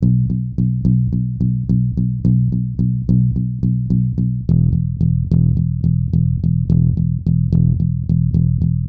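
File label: Bass Chill B minor 108 bpm; Guitar and Bass; Ableton; Factory Packs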